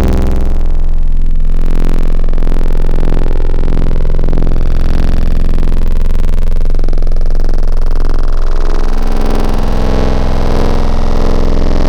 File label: Dist WaTech; Xfer Records Serum; Serum Presets